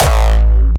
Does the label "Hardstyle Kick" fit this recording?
Yes